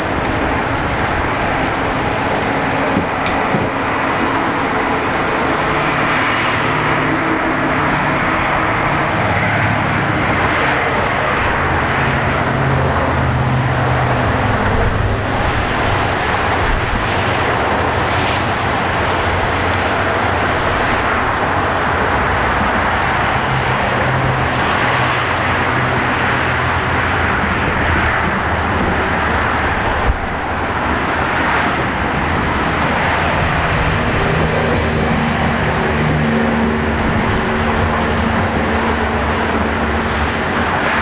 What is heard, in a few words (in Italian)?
Autostrada, Veicoli; Effetti sonori